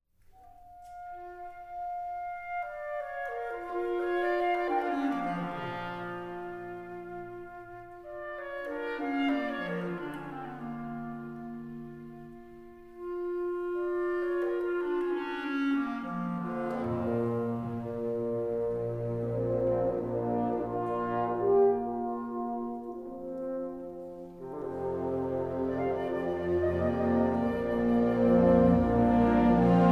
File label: Concerto For Piano & Orchestra, Tranquillo Con Calore; Caio Pagano